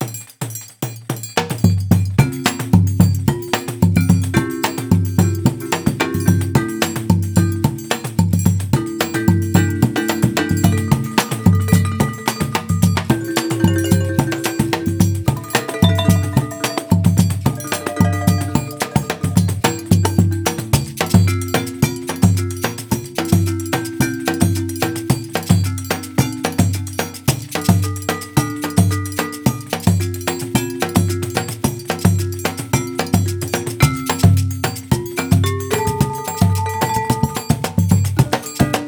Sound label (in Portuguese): baio zabumba percusso, brazilian series zabumba; muletone audio